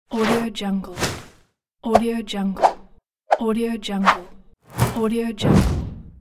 swoosh sounds

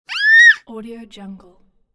child scream

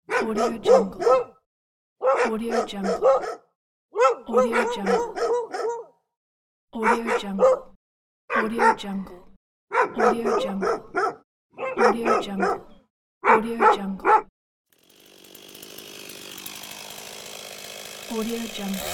halloween sfx collection; warpjumper

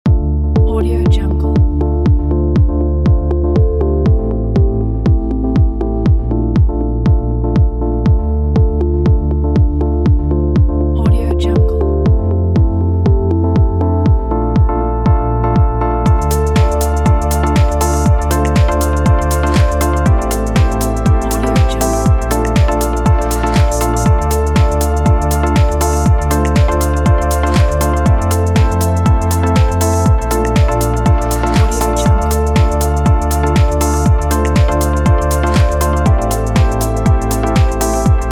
warm summer house

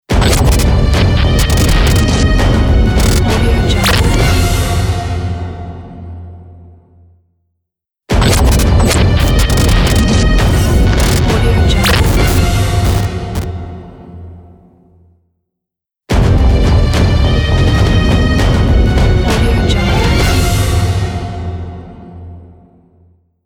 epic glitchy logo

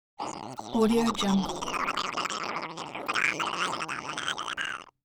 alien creature furry devil

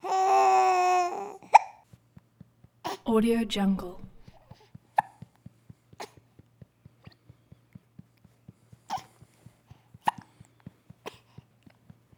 baby breathing infant vocalizations coughs; prosoundfx

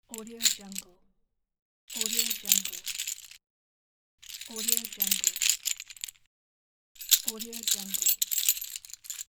keys

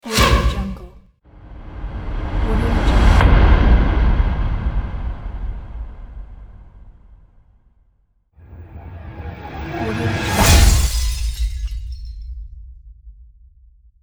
impacts; crazytunes

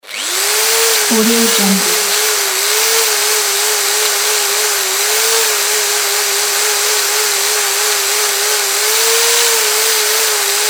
drill

